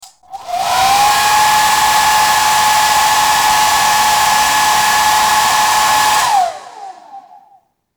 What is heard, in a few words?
Hairdryer